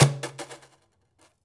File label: Prepared Drums